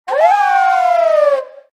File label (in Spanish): Grito de Lycanroc forma nocturna